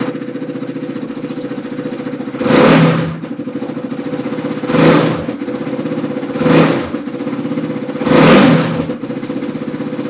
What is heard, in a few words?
motorcycle engine